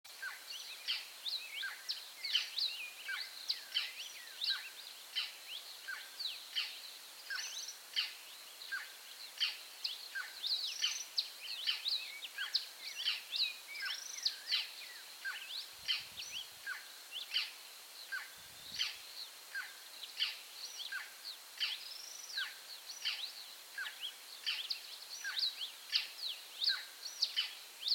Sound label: Scarlet throated tanager